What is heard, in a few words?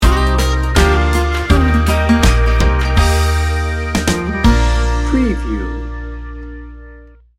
rustic heartland sting bumper